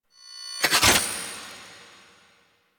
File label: Catch Glaive; The War Within; Mountain Pass; Teshin